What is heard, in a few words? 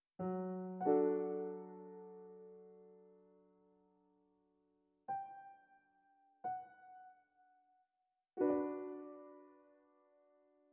theme piano; party music for the pool party in the movie